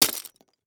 Impact Plastic Debris Hit By Copper Pipe; Impacts

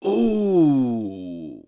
Human Male Ooh Surprised Disgusted Ooh

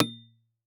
Impact PVC Pipe Hit By Large Metal Pipe; Impacts

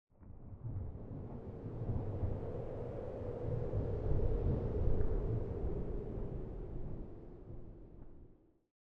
Clouds Moving; Varazuvi; Natural Environments; GDC Game Audio Bundle

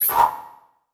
Transition Hit Impact Electrical; Adobe; Production Element

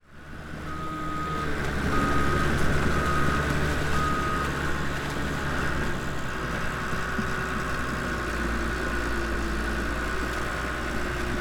Industry Bulldozer Backing Up And Driving In Mud

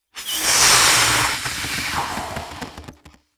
Cartoon Balloon Filling With Air From Tank; AdobeCC

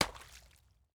Liquid Water And Ice Impact

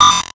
Multimedia Internet CD-Rom Flash Radio Element

